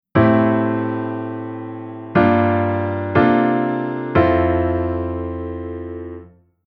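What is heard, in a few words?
Chord Secondary Dominant IVonV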